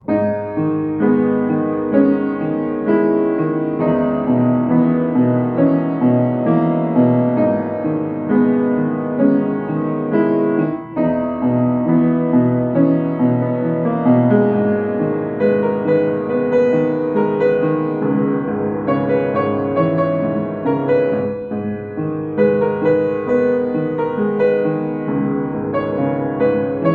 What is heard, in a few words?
Piano Instrumental